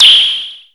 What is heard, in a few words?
NOISE; DRUMS & PERC